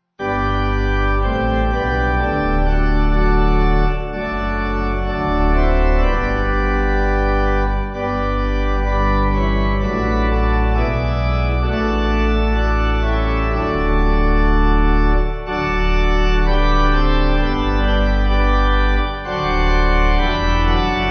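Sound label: Pipe, hymn backing; Im But A Stranger; St Edmund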